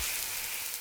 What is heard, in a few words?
acid mid; Rampant